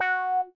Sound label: F#5 meow